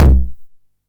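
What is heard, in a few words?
909 low tom, tape saturation; Goldbaby Tape808 & Tape909; The Tape 909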